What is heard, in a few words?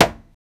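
impact shock of metal with, plastic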